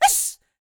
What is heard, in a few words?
YELP, World Voices, ANDES FX